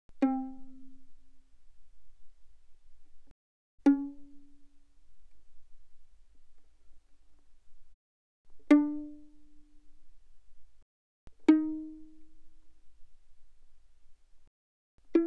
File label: Violin pizz mf sul G C4 C5; Strings; violin2012